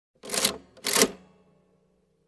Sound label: Typewriter slow reset